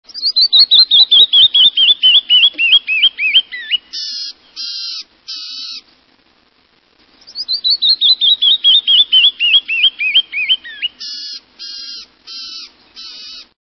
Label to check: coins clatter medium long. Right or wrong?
Wrong